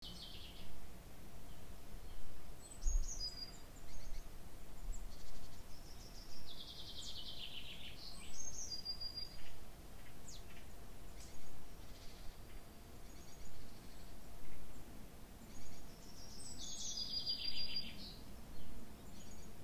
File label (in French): Son Ambiance Mix; ue4